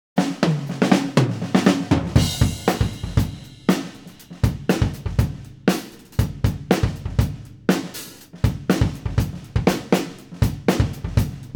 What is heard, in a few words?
Drums Isolated